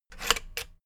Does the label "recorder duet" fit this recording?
No